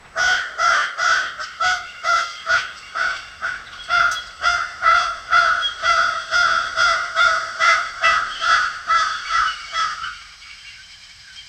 harsh guttural caws, crow; harsh guttural caws of ho

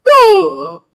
uh-oh

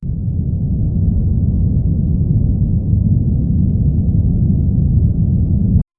low wind with drone swell

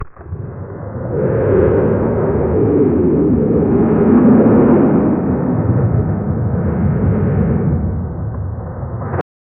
roar great deep sea monst; sound effect

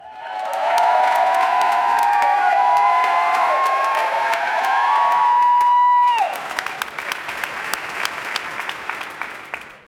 applause cheers excited

